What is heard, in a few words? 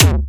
Kick, Ghetto Electro Kicks